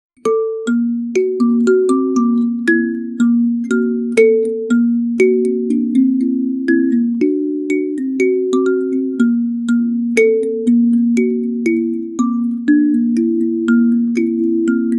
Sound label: soft inspirational africa, african